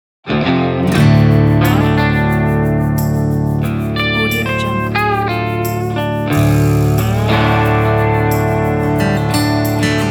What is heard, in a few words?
a country